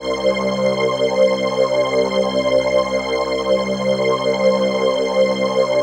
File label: PHASE PAD, ATMO PADS; Best Service; XX Large